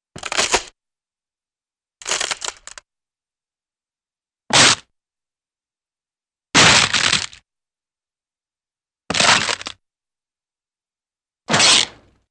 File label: Various Wooden Crunch, cartoon; Hollywood Edge; Cartoon Trax